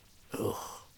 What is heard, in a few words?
Ugh Male